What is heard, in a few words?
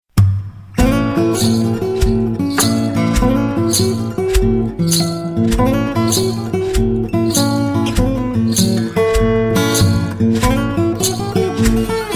waltz